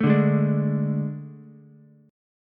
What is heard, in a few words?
A Major 7th Suspended 4th, Ukulele; AM7sus4; strummed; JGuitar